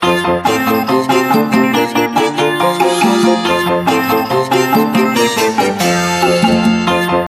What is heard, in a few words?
Jingle Mail; Taiko no Tatsujin DS Touch de Dokodon